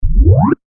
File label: beep; cstrike